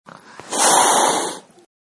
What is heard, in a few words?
hiss